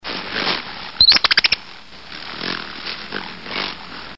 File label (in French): colibri